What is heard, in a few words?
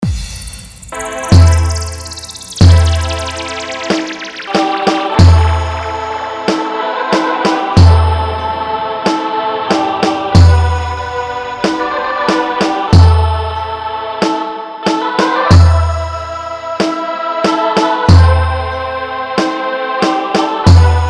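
Piano Keyboard Vibration Mix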